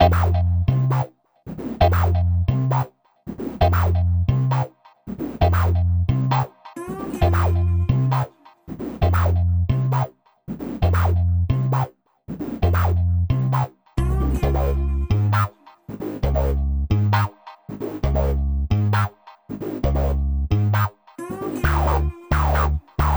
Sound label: Bass; Cantal